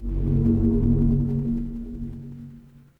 magic scifi alien

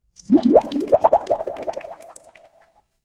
water bubble

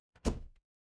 door close

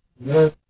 ui send

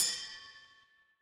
instrument bell

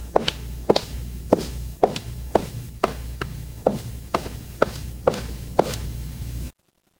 footsteps heels

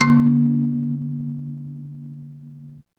instrument kalimba

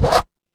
transition record scratch

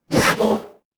ui toast

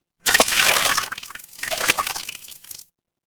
water splash

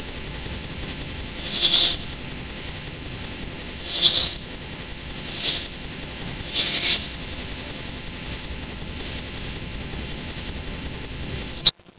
ambience forest

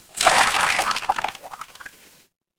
water swirl

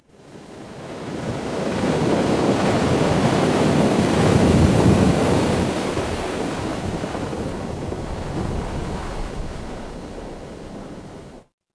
ambience ocean

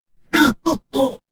retro game enemy death

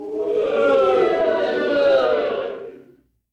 crowd boo

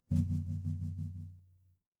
office phone vibrate

